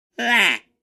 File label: Comedy mocking sound